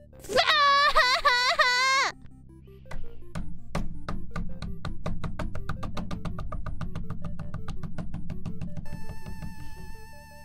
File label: fuuuuuuuuuu bonk; IRyScream